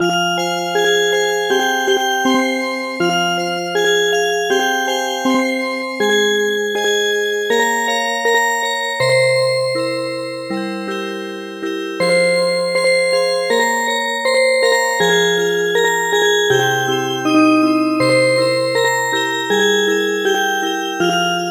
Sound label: Music Box; Armenian lullaby